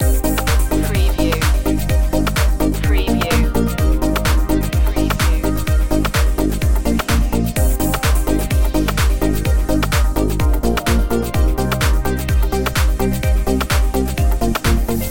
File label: pulse loop